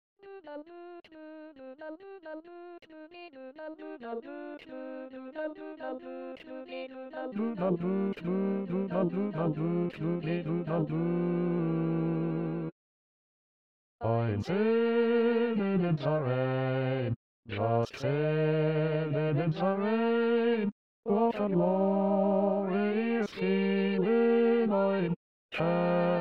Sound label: Bass SATB